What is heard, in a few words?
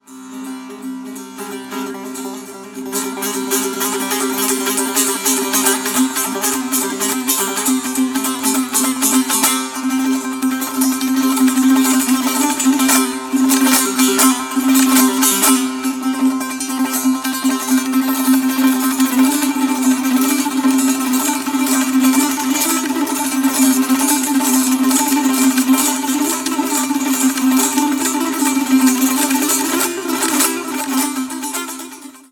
Tanbour Solo; Sohrab